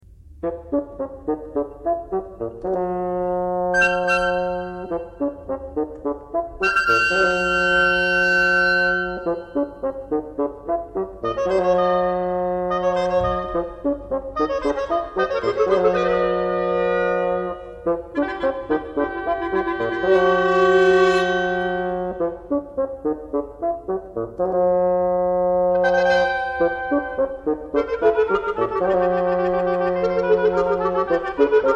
Carnival, oboe, clarinet and bassoon

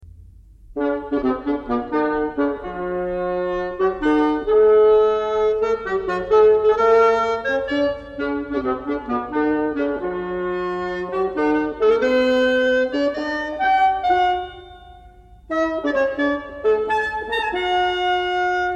Quasi Folk-dance, oboe, clarinet and bassoon

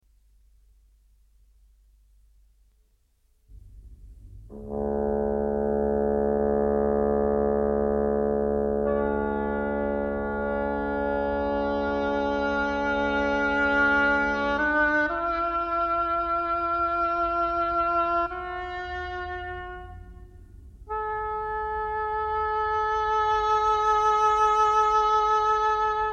Obligato, for oboe, clarinet and bassoon

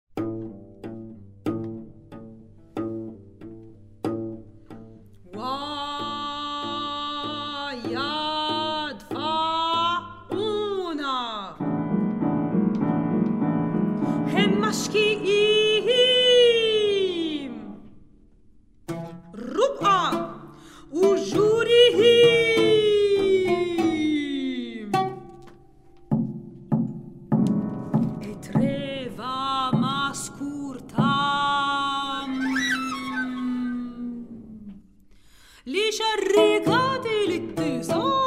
soprano, oud, cello and piano

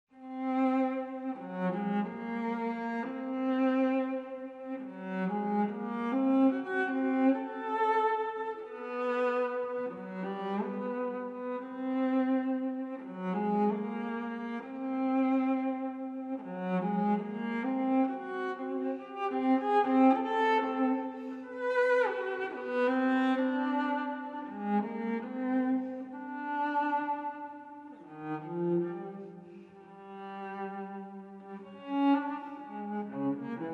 Moderato, Cello Solo

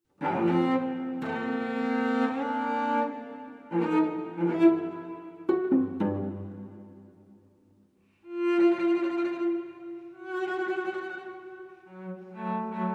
Furioso, Cello Solo

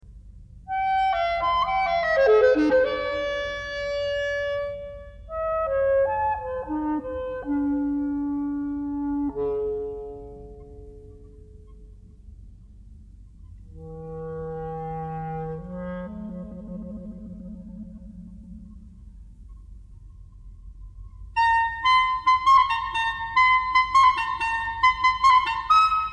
Clarinet solo, oboe, clarinet and bassoon

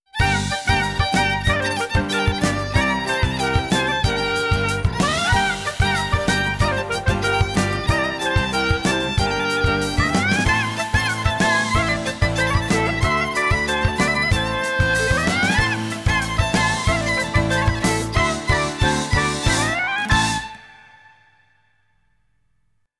Orchestral transition

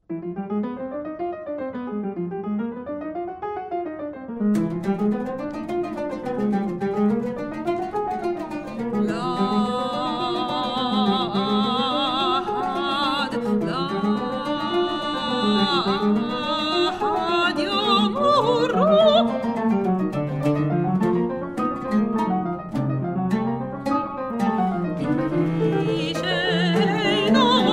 soprano, oud, cello and piano

